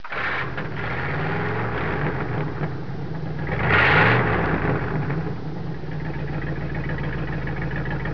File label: cars; GRSITES Graphics